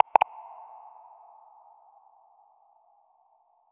short tactile ui click

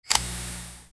soft camera flash sound w

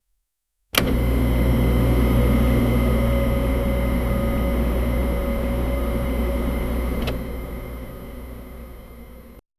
the sound of the operatin, operating system

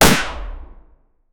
impactful single shot, failure

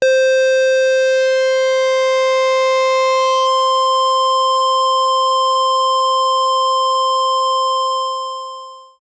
chiptune

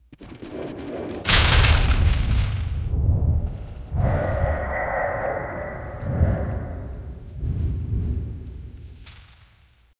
stone panels grind temple; chase

